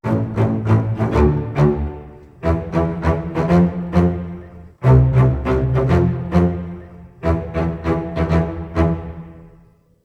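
100 strings bass part, A minor, Orchestral arrangements; PowerFX - Downtown Orchestra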